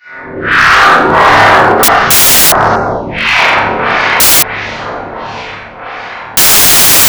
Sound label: game over; RPG